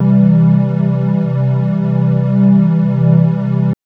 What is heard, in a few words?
Synth TrianglePad maj c2; TrashLibrary